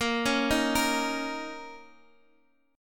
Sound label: A sharp Minor Double Flat 5th, A sharp, down, Guitar, Open D